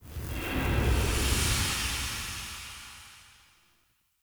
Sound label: Encounter Pillar Dissolve Out; Encounters; Ode Pillar